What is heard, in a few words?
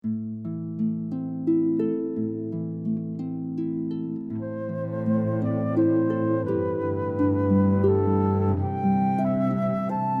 harp flute cello